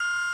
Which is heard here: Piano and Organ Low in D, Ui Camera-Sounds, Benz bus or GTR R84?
Ui Camera-Sounds